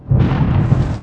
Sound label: thdr; Print Artist Demo